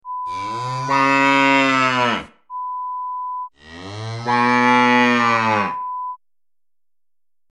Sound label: Cow